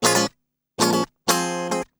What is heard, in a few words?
GUI 120 5aA#; Best Service Gigapack 2